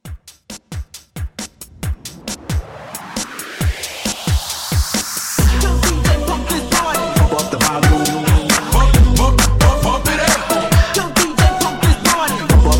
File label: Quick Hype Re Drum